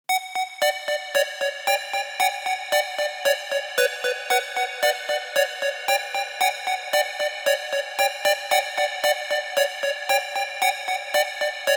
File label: Sinus 35Hz; autozvuk spl